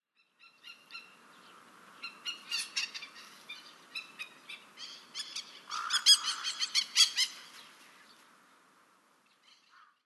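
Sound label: Common Kestrel alarm; keikai; utsunomiya; koe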